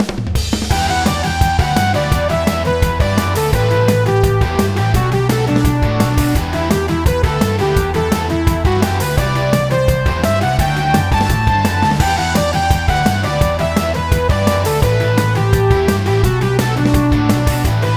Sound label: Battle; rpg maker vx ace